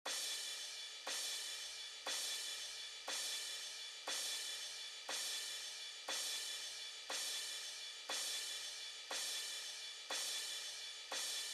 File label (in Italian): Piatti